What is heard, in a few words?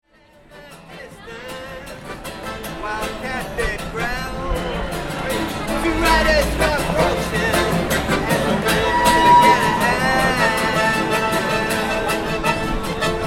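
Ambience Melbourne Busker Guitar Accordion; Melbourne Buskers; Concert Sound Effects; Concerts (Sound Effects:Backgrounds); Foundation1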